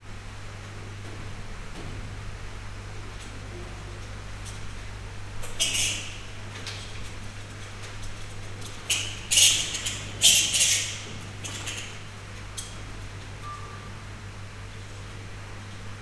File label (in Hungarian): indiai repülőkutya; állathangok; nyíregyházi zoo 2010